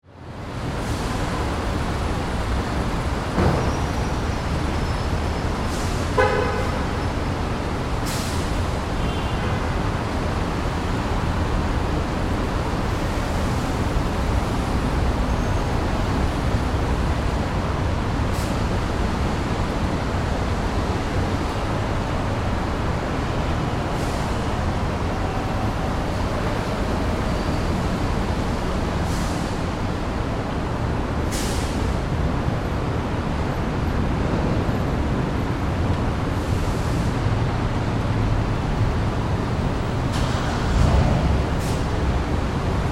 City Rooftop, Ambience, City, Rooftop, Perspective; Cities (Sound Effects:Backgrounds); SoundEffects-BlastWave